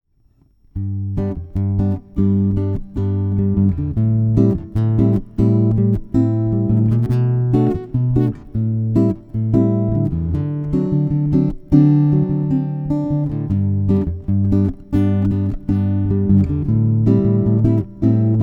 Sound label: Nylon Arena Hall